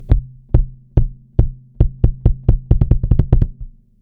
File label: FET compressor kick just